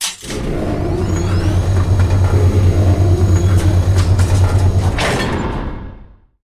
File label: Island Elevator Up